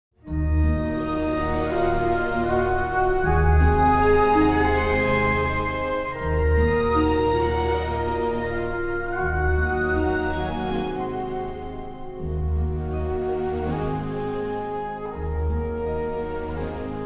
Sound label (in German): Schlagerbus